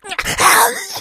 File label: death, infected; Left 4 Dead 2; Source Engine